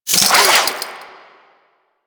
Necramech Repair Drone Idle Movement; Warframe

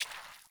Fawn Footstep Water, Foley; Animals; Ambient

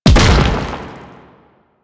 Hand Bomber Proj By Explosion; Warframe; Man In The Wall

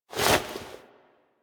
Foley Misc; Warframe; Operator

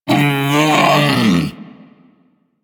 Predasite Vocal Death; Warframe; Hunting Critters

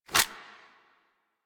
Pistol Reload End; Weapons; Warframe; Tenno; Priest Pistol

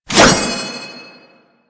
Sword Swipe; Warframe; Duviri; Duviri Dax; Weapons

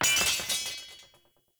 Short Sword Drop; Weapons; Enemy; Swords; Sinecure Short Sword

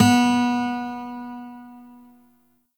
Shawzin Oct One B; Warframe; Lotus Shawzin

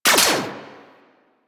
Rifle Mech; Grn Kuva Lich Rifle

